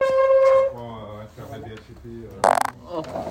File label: f4rt